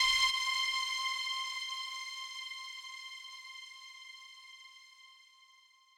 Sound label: lead